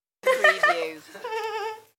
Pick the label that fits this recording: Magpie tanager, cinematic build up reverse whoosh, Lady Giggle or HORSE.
Lady Giggle